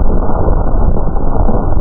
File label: bloc push